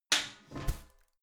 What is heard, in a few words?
garbage can close lid spring click